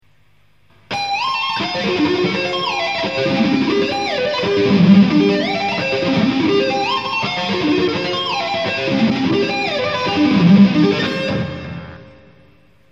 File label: slide sweeping, guitar